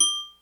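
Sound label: triangle high; xr10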